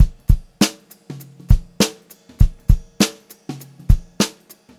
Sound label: Funk Groove Drumloops; USB Soundscan vol.38; AKAI